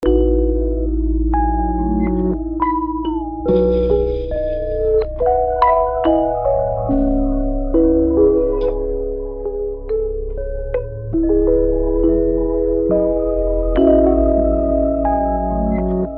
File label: almost lost it melody